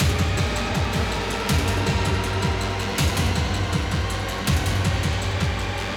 Music Winning Loops; Battlefield 4

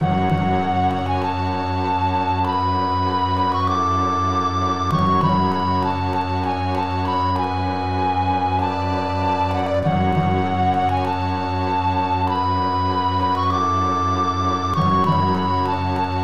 Flute Intervenes

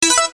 Ding

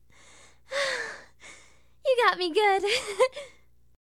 Tickle; SFX Kitchen; Hilzartov; Fairy Size Doesn't Matter

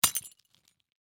Glass Destroying; my cute commissar 2020 gamerip

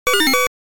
Monster touched; Dig Dug; arcade

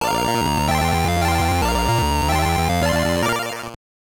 Jingle; benjamin blumchen ein verruckter tag im zoo; gbc

